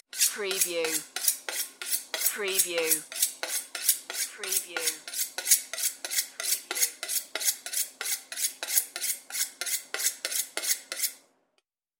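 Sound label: Sharpening Knife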